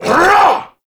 Vocal Attack Short; Warframe; Duviri; Dax

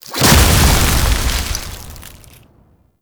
Snow Globe Spawn; Warframe; Nineteeen Ninety Nine; Deep Archimedia

